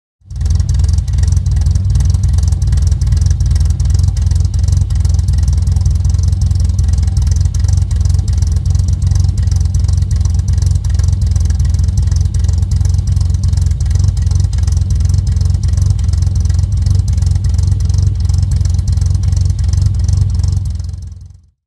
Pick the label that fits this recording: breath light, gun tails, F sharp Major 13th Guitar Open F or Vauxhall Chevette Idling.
Vauxhall Chevette Idling